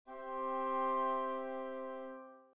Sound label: chords; Nikolai Rimsky Korsakov